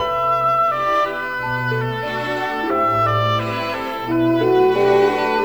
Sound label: keys, Loops, Sad